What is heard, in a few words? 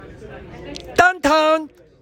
done tone